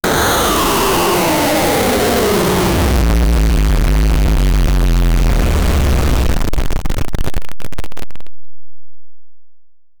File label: distorted 8 bit explosion, bitcrush